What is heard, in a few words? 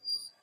squeak; Source Engine; cstrike